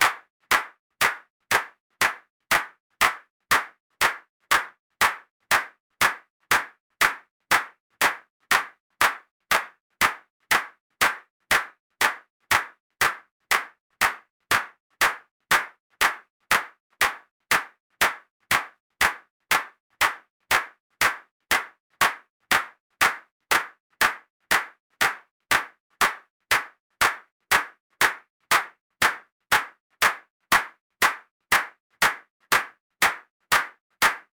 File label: rhythmic clap, percussive